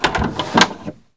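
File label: door latch open; The Elder Scrolls III - Morrowind; Morrowind GOTY GOG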